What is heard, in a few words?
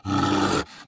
zombie run; smite; npc; roman archer